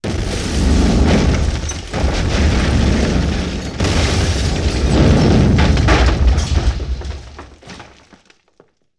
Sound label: Multiple explosions with debris scattered